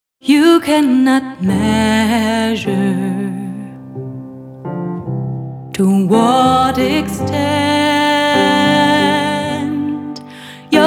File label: Birthday song